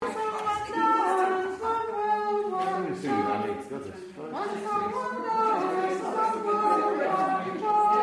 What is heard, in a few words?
tenor